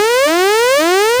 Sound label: wave start; Tower Defense; freepixel